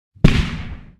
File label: torpedo; World Of Warships